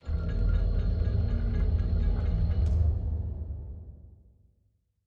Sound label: City Elevator; City SFX